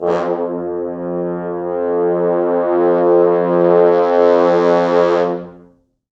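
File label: 4 French Horns, Horns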